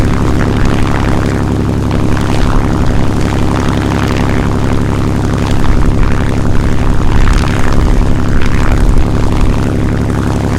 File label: mustang rear, engines; War Thunder